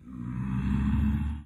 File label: death